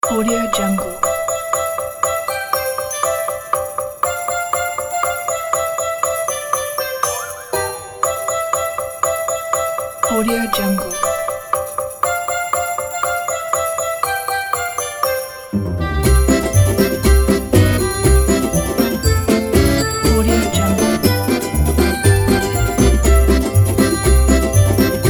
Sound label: jingle bells